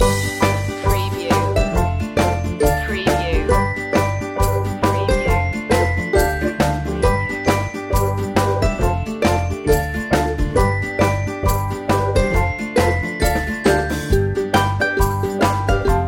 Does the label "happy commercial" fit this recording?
Yes